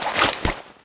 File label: cleaver draw; tf